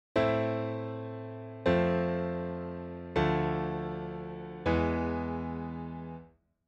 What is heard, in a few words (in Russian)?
cepochka akkordov lya fa re mi